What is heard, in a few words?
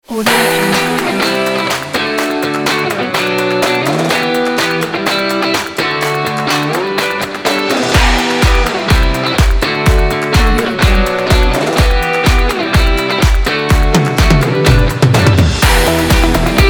upbeat energetic inspiring pop